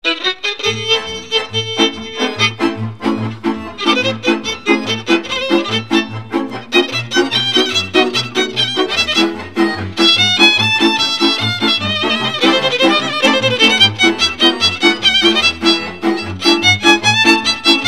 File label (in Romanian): Batuta